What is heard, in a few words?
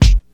2000s good bass drum f key; kick drum samples